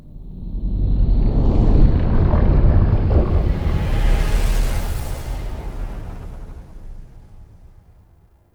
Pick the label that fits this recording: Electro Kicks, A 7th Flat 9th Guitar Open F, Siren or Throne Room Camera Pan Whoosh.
Throne Room Camera Pan Whoosh